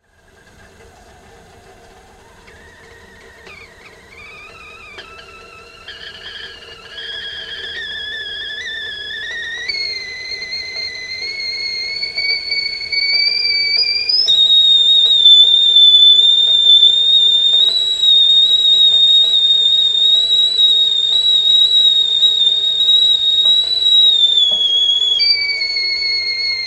Kettle boiling and Whistling, Kettle coming to the boil then wh; Kettle coming to the boil then whistling and turn off; Kitchen; Tom Hutchings